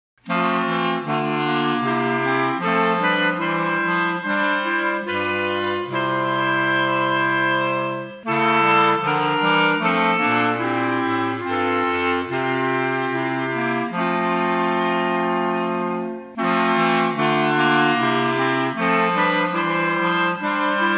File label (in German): Morgenlicht Klarinette